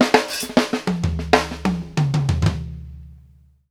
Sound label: OPEN HAT2 L, Drumworks FILLS 96; Sampleheads - New York City Drumworks VOL-1